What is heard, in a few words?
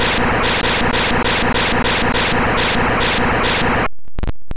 FLAMETHROWER